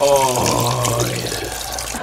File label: peeing